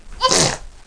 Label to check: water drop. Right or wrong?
Wrong